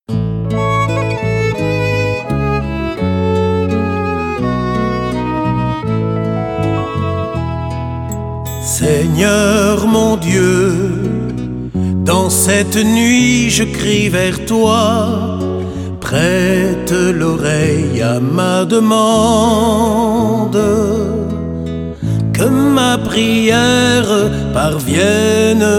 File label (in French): intro musique